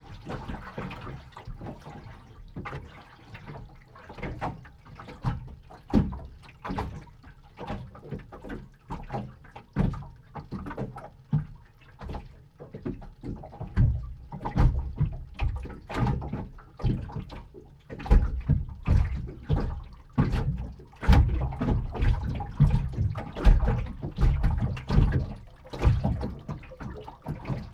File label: Boat Hull Water Engine Under Hood, Molgaard F2; 2006; Pole Position; DPA4021